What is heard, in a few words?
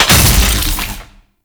Shotgun Finisher Hit; Sentient; Warframe; The New War; Kahl; Weapons